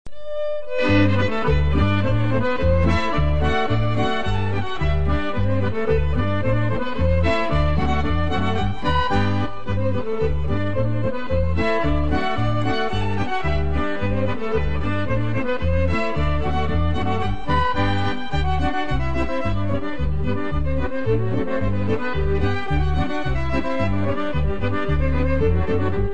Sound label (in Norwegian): Skotsk